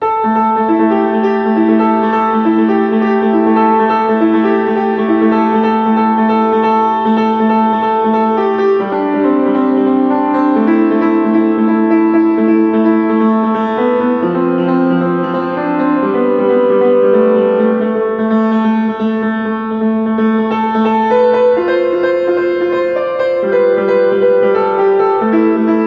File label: Piano Cover